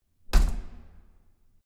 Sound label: corridor door close hard